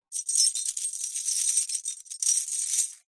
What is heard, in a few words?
foley keys large bunch prison guard fumble select single key